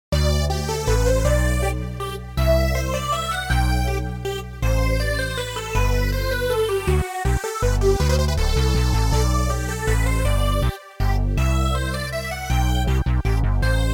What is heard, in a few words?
Minuet in G Major Synthed; Christian Petzold